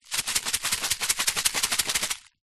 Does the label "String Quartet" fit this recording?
No